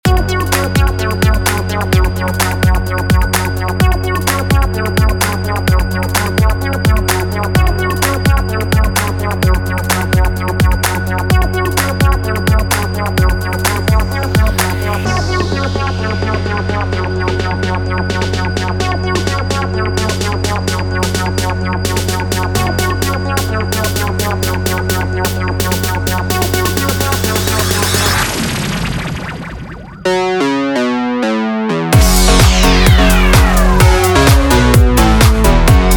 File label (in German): Trommelkreis